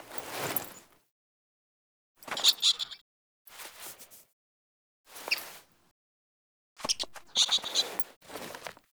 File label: Animal Rescue Squirrel Free; Encounters